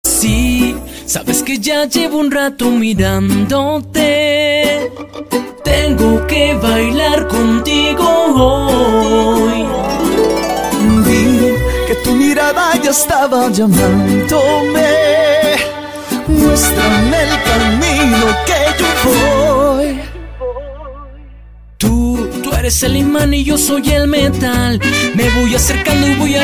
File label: mariachi